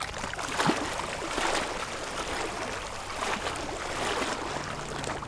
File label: Water Lapping